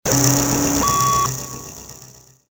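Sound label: capture the flag flag; Soldier Of Fortune II Double Helix; XBOX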